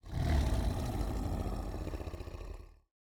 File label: action purr; rengar